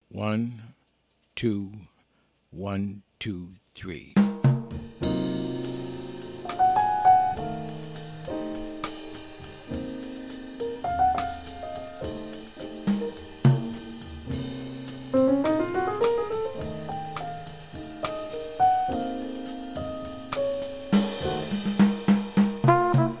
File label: Bossa, minus bass